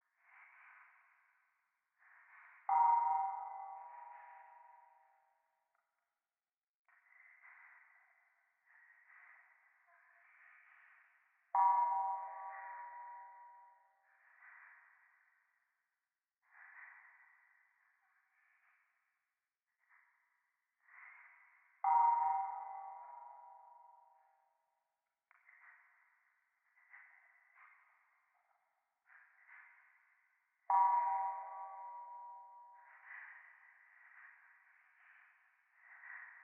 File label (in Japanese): 廃墟 左耳密着吐息 寺